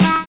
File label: HARMONICA